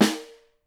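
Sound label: SNARE, Percussion; Miroslav Vitous - Percussion & Harp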